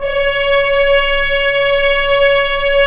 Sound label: F. HRNS, FRENCH HORNS; Roland - LA Composer vol. 1